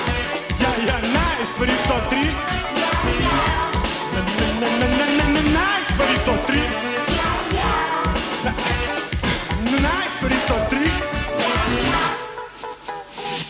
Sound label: Rap